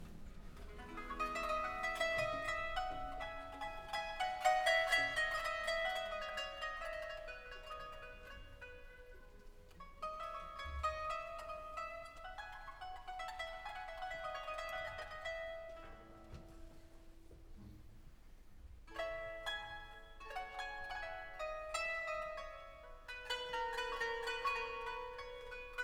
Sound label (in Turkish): Kanun Taksim